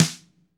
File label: loose skin mute, drums